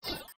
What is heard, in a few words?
Security Bagage Entry, ambience; nexus tower